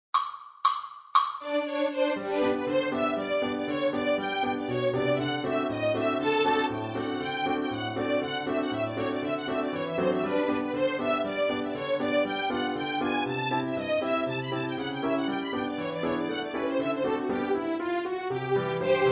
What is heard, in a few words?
Polka 116